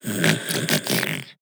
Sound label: Otter sleep loop